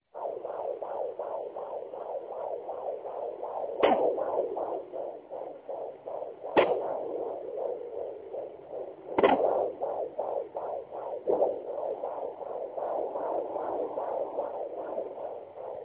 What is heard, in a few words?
Baby Kicks Doppler